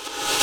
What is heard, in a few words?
snare verb REV; Breaks N Beats Sound Kit; just SNARES 3